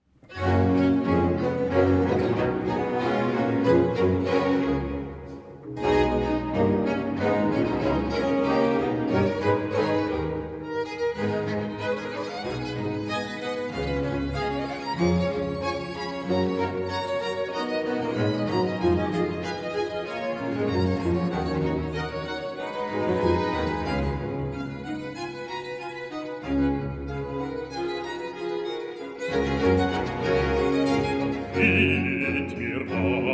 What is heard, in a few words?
orchestra live aria; new baroque orchestra berlin; bach